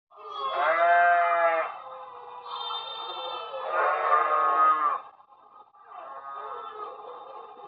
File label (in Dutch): schaap, animal sounds